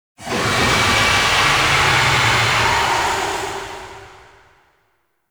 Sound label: Boss Vocal Spider Summon Stinger; Enemies; Warframe; Oraxia